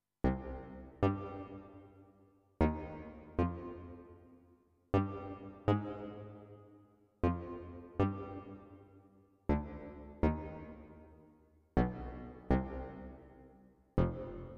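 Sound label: Sequence Bass, digital piano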